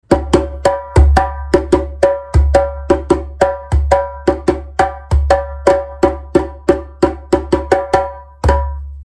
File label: djembe